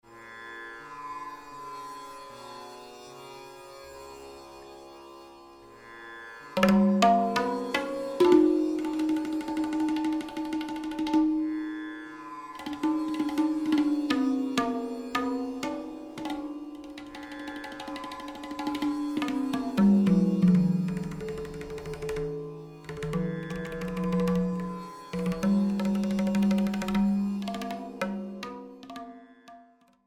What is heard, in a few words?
Tabla Tarang and Tabla